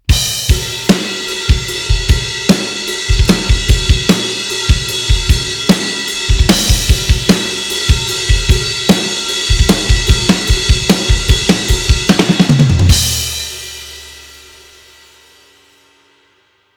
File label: Big Ride Groove